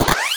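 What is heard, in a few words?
Weapon pickup; Megaplane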